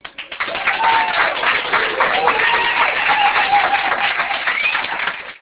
Opera; Audiovox; CDM-8912; La Traviata

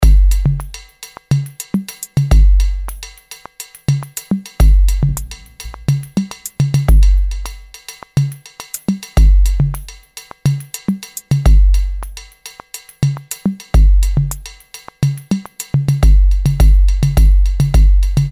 RING BIGBEAT; HTC; Ring Tone; Stereo; E-Club Ring Tone Package 1

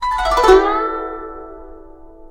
zheng; Samsung GT-i7680; cmcc

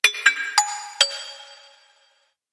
Reverberant Metal, notifications; Motorola Atrix-2

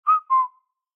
Bird Cicada Notification Day; Xiaomi Redmi-8A

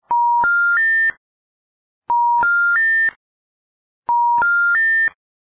warning; Samsung; SGH-i620

